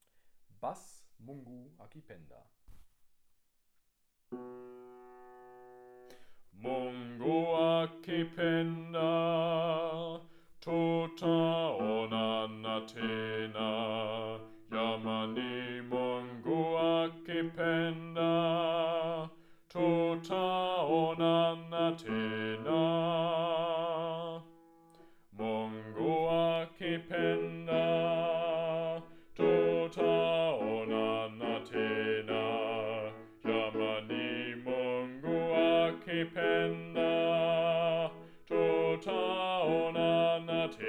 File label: Bass; Mungu Akipenda